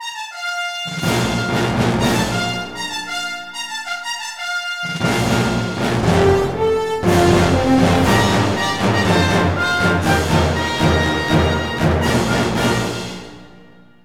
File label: Victory; Heroes Over Europe